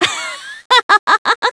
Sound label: laughter; svencoop; player sounds; lappland